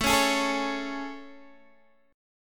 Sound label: A Major 7th A, Guitar, Open D